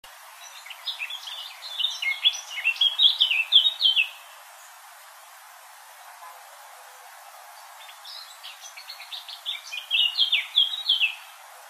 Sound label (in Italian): capinera